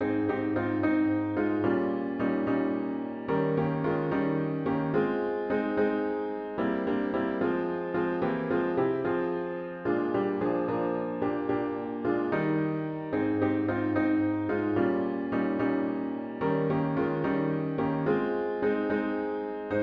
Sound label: piano only; Open My Eyes That I May See